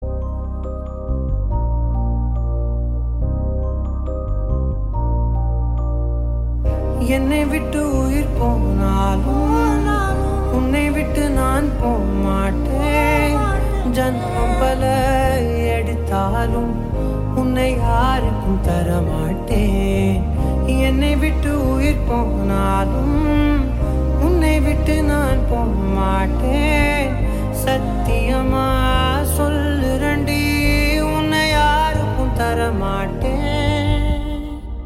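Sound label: Theme background music Ringtone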